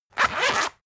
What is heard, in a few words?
zipper long open rapid; objekte; reissverschluss